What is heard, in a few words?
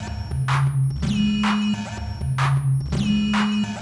ACID JAM